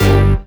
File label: Synth Shot; Vengeance Samples